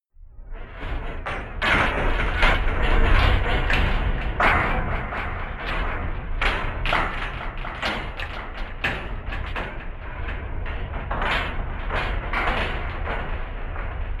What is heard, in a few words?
atmosphere banging in the dark